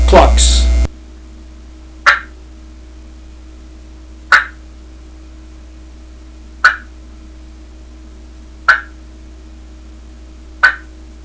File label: dev clucks, turkey; dixie